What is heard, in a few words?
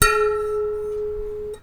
rstring